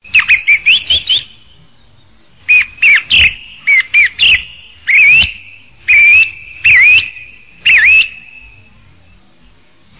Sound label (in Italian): tordo